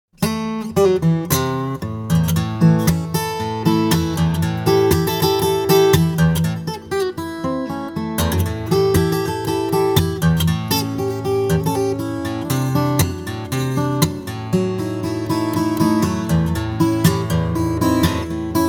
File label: Strummin' Delta Blues, guitar